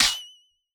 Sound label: hit block; battle; cross-code